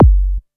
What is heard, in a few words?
bass drum deep, techno trance drums